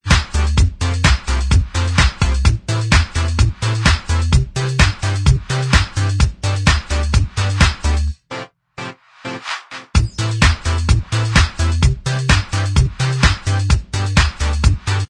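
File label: instrumental, backing tracks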